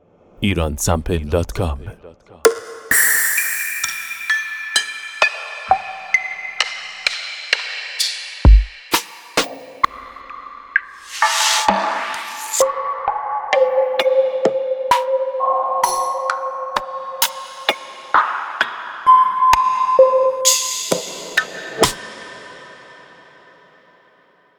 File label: Fx Perc; fx percussion